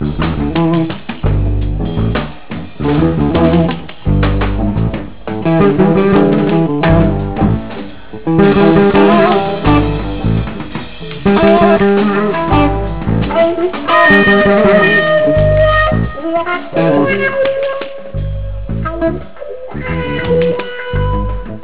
Funky Tonk

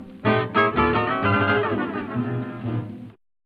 chromatic gliss chord; django; django bag of tricks

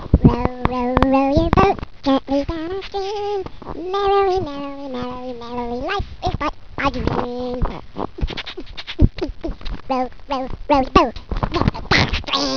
row your boat

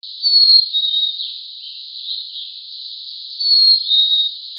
chickadee; birdsongs

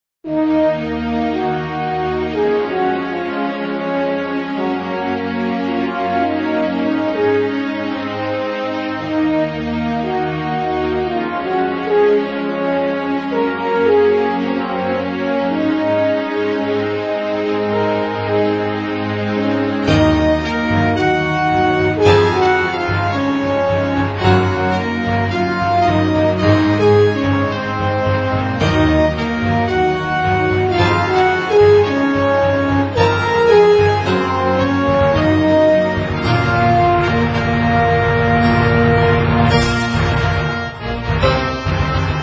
main midi

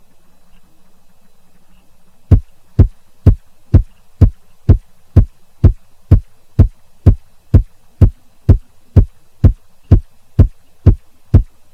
Bassdrum

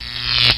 spark

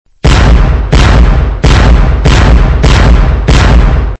grenade launcher

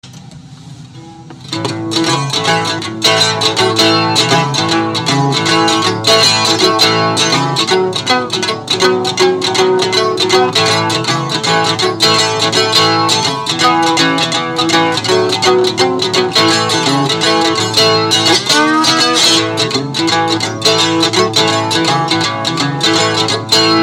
3 string punch

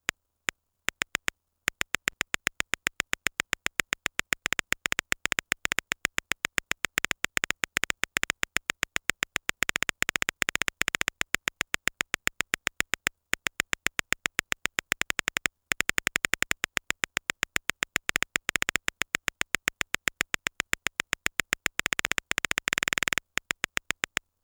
snares

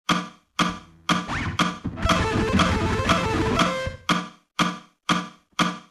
sweep picking fast

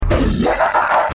noise

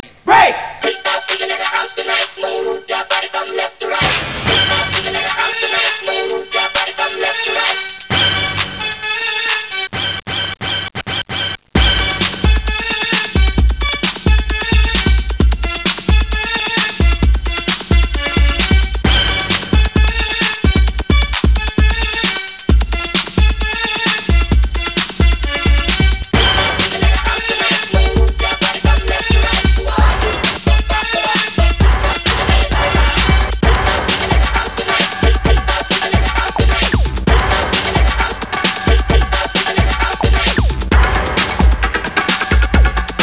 rythem

